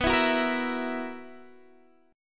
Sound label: B Major 7th Suspended 4th B down; strummed